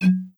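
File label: shells eject tube, super shotgun; weapons; doom; ssg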